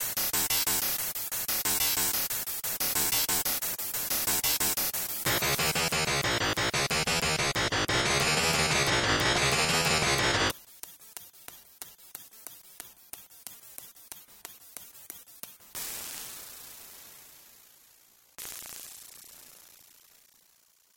Game Win (Ending Theme); Vectorman 2; Genesis

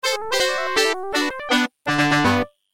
Game Over; Quack Shot; Genesis

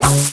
ROCK

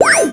ROCK

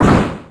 ROCK

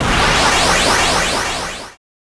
ROCK

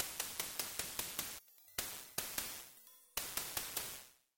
Game Over; Magical Hat no Buttobi Turbo! Daibouken; Genesis

